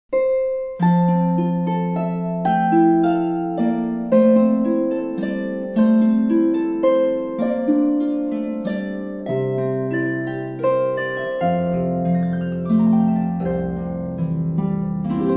it came upon a midnight clear, christmas carols on the harp